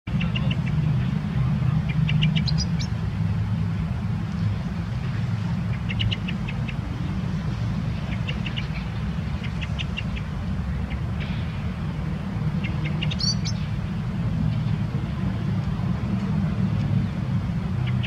Turdus merula female, صدای توکای ماده برای جلب جفت نر